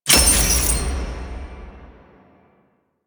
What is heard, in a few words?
Chain Break A; Warframe; Harrow; Red Veil Temple